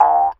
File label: Jews Harp Funny Boin, cartoon; cartoon fx